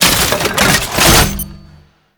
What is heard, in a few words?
Rocket Launcher Pickup; Warframe; Weapons; Tenno; Lasrian